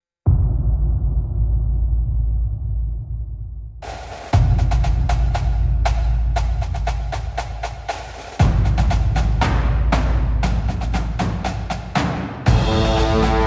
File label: soviet march